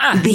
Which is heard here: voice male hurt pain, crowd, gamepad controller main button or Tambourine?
voice male hurt pain